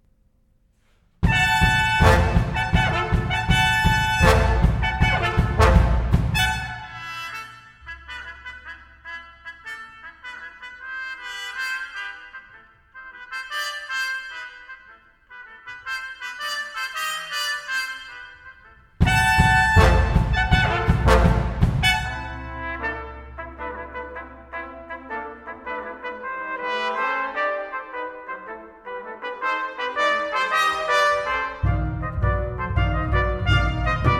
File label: Prelude for solo clarinet and jazz ensemble; L. Bernstein; Prelude, Fugue and Riffs